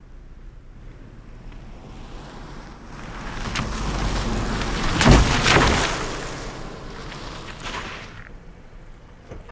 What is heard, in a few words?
Car Tires Splash, tires